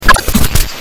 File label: teleport; slender